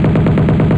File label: Machine Gun, Military Sounds; MineSweeper v3